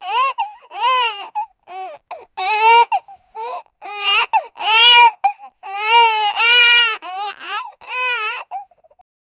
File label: baby crying heavily